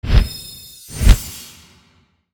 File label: wild Landing; netent